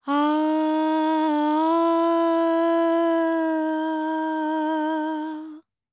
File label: World Voices, INDIA; ILIO; Vocal Planet